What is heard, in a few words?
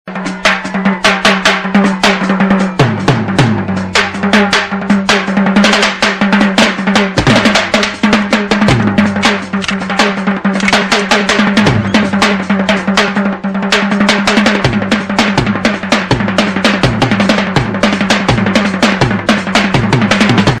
Drums